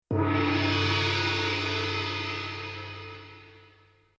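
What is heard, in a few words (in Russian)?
гонг одиночный открытый яркий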